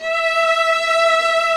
ARCO VIOLAS; OMI - Universe of Sounds

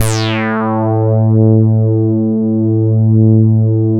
OBERSYN A3

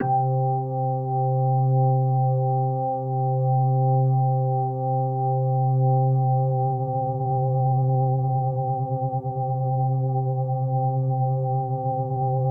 C4, Synth Line

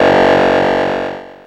VCS BELL; ANALOG BELLS; Ambient; Zero-G; Ambient 1

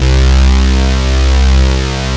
PANNER SYN, DANCE; Pro-Rec - Synthsonic; Z1 DANCE

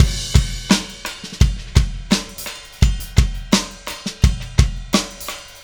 Static Beat Crash; Sonic Foundry (Sony Creative Software); Indie Rock Anthems; Session 13 - Static

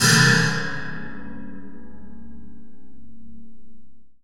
AMBIENT FLY; Rarefaction; Poke in The Ear With A Sharp Stick

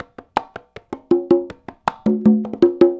160 Cg Mambo; Roland BK-5; Loops & Phrases

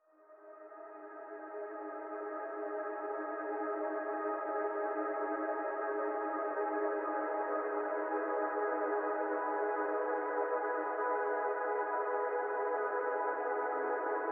depths pad

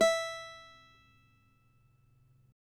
CAVAQUINHO E, Guitars; Spectrasonics; Hans Zimmer Guitars VOL-2

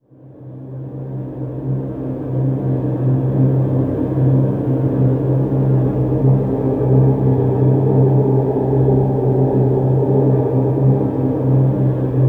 Low Complex Drone; Sonic Foundry (Sony Creative Software) - James Johnson Spektral Minimalism

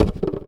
fooley

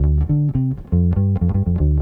Bass, 70s Analog Funk

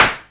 Snare, Roland MC09

